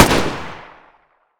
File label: aks74u fire